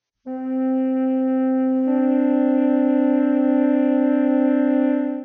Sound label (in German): flöte c2 d2; Intervalle, die man durch Potenzen von 2 und 3 darstellen kann